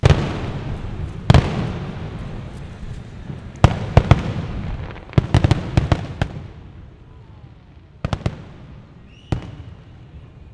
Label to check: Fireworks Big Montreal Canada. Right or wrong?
Right